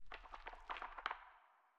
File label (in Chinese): 苍白垂悬苔藓声